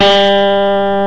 Rock Guitar Cleangtr G3

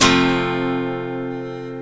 Acoustic Guitar Chords F maj

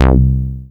synt bass

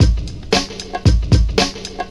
114bpm

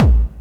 Bdrum

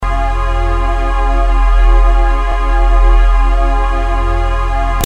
Chord

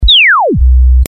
Zap

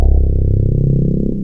Bass

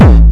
Bdrum

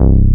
Bass

